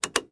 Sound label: lever switch; Blackeye